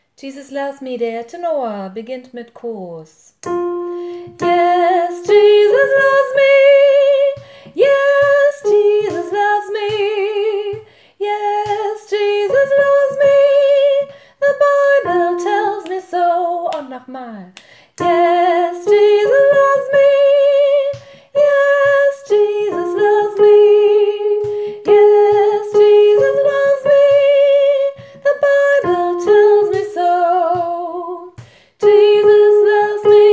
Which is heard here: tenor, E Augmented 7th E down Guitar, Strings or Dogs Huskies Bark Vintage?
tenor